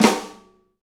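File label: Pop Snare Flam; Snares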